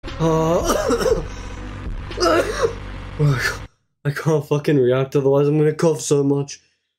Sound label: cough